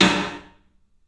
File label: PA DEEP L, CLUB SN VRB; 3D Sound; 3D Drums